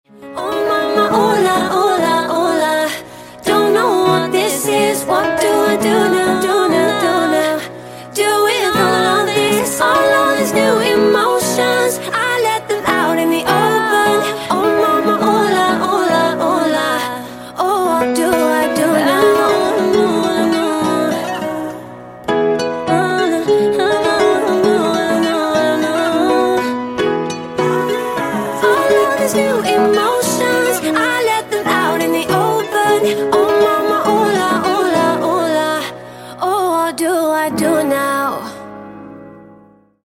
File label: acoustic